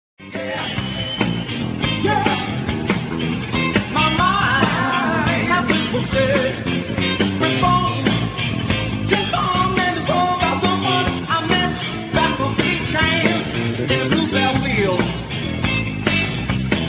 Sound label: walking